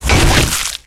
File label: Flak Cannon Retract; weapons; Requests Studio; UT3